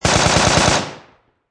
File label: Machine Gun M4A2 Long Burst Close Perspective; Machine Guns; LYN084 Guns & Military sound package